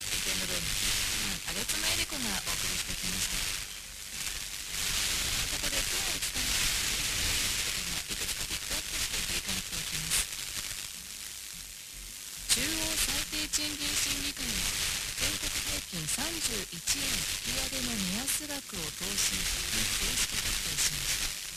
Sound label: 82.2MHz, FM; FM20GUNMA